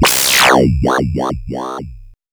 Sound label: ARP2600; AMG - Vince Clarke Lucky Bastard